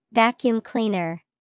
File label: Vacuum cleaner